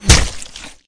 Character Body Impacts

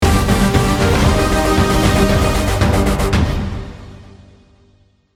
Won Round; Counter-Strike 2

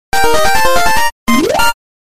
Victory Announcement (8-Bit); Ar tonelico: Knell of Ar Ciel

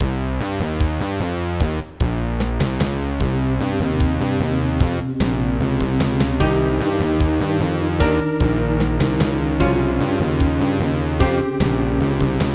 Cave

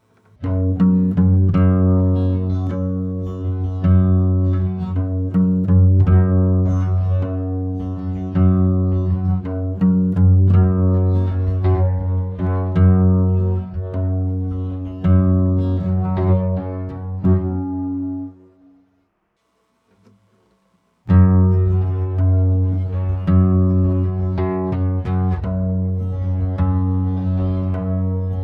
Engineer Desert Gtr; Team Fortress 2 Source Filmmaker leak music

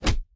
Enemy Attack Hit

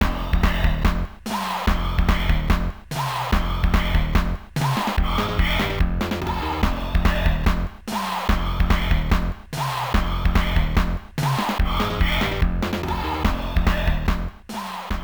Continue; World Heroes; SNK; Neo Geo; arcade

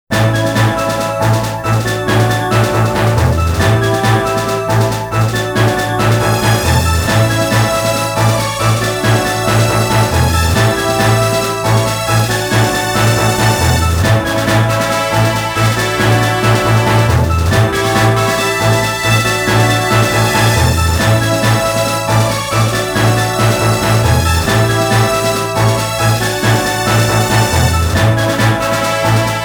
Victory Pose! ~Battle Victory BGM~; genso suikoden tierkreis ost

